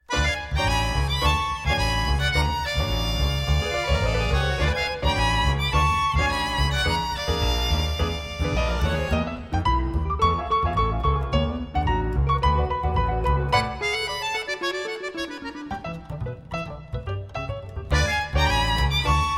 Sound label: london tango ballet, accordion music